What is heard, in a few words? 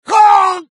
death